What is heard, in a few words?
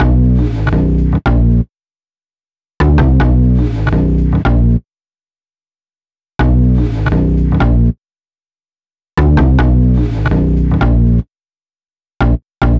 Massive Upright; Sonic Foundry (Sony Creative Software); Cinematic Funk Urban Tone Poems